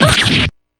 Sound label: damage